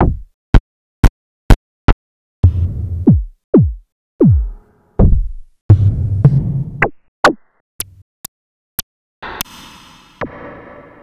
Moog Modular 55

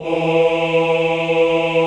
OHH E2, Classical Choir; Best Service; Extended Classical Choir

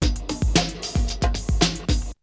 Drumloop; ZERO G - Datafile DISC 3

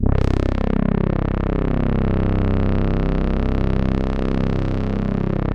SQ80 ZBRAS, synbrass; Synthesizers

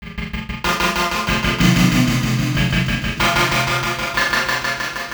Ripple Perc

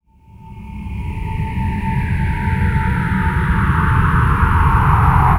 SPACE-FX

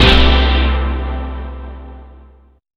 STAB

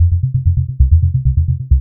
INST LOOP, House Side Of 2 Step; USB Soundscan vol.51; AKAI

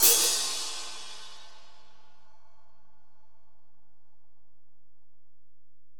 Ride, Alesis DM-PRO, Drum Machines

